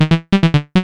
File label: Happy Loop